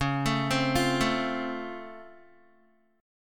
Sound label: Db Augmented Major 7th, down, Guitar